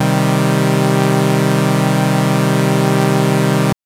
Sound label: Synth SidSaw min c2; TrashLibrary